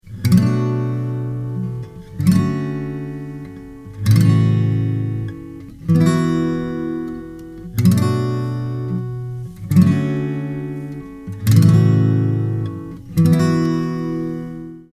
Chords, guitar

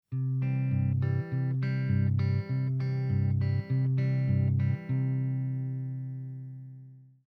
C Chord Bass, guitar

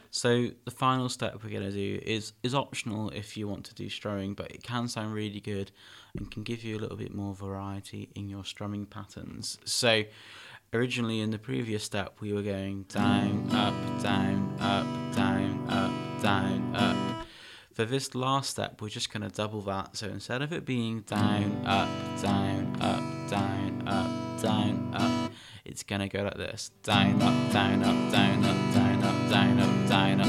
Doubling, guitar

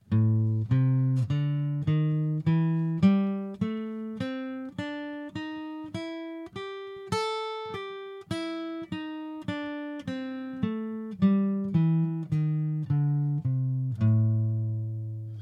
A Blues

